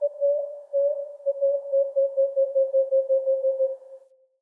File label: Prairie Day Distant Bird